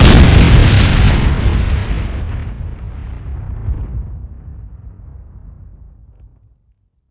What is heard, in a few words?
explode